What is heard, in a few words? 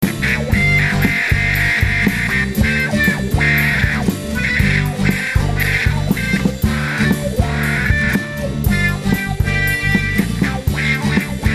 rock